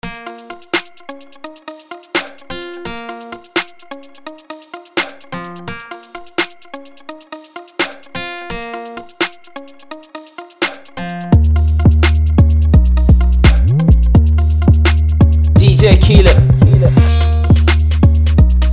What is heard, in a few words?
BEAT